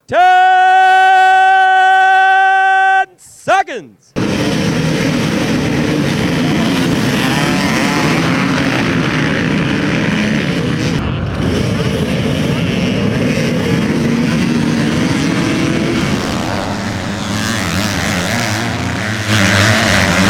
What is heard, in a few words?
ringtone